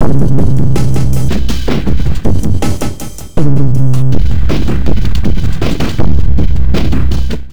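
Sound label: neuro, drum loops crazy processed, 80 bpm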